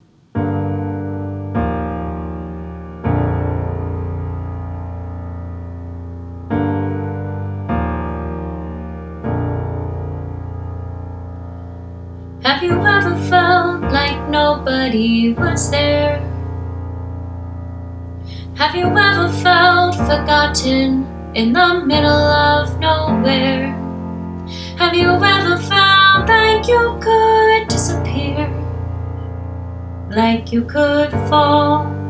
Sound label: solo